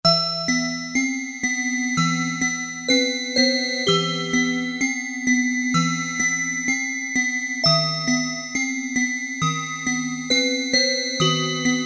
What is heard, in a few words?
Light Harp, Casio MT-600; Tones